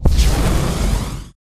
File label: atlas dash close; Titanfall 2